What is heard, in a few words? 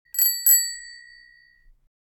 Metal bicycle bell single ring; vehicle